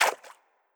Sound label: Spiderweb Throw